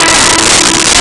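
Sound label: Over Drive Guitar E4; Nintendo; July 2020 Gigaleak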